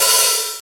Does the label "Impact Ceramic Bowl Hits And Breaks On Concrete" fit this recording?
No